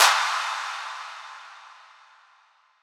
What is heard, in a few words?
Impact Clap; Riddim; One-Shots; SFX; Ghosthack; Riddim Essentials Volume 2